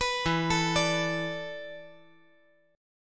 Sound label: E 7th Suspended 4th E down 5G 4C 5E 5A